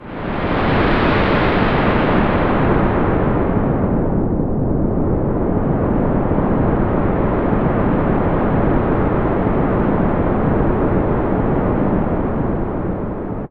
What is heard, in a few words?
SFX, synth atmos & fx; Dream Zone